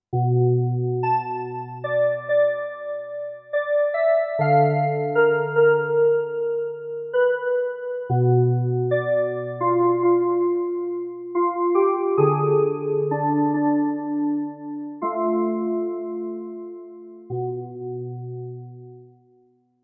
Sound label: Stereo Gamlan